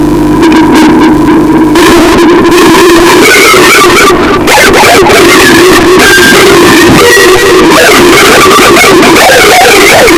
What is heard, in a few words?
Goosli Noise